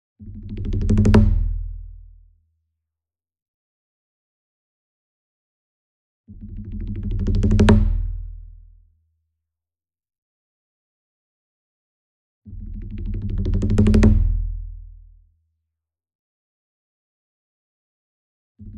SINGLE DRUM Kodo Roll Soft Beater Near, Cinematic Strikes; boom library; Cinematic Strikes Construction Kit